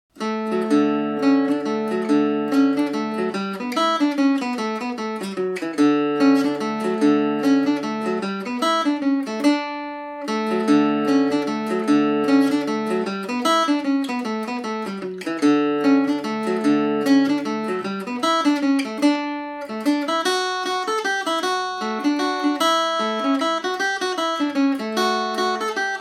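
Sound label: D major at 40, irish mandolin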